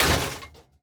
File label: metal break